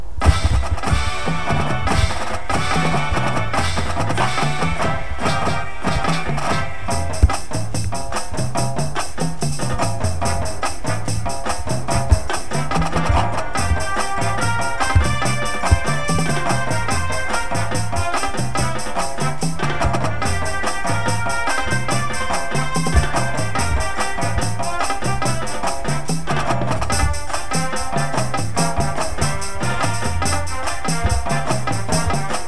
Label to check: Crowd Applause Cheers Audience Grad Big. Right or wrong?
Wrong